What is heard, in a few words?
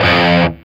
F#3 SOLDANO ROCK, Guitars